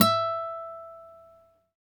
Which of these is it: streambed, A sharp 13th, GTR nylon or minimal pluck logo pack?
GTR nylon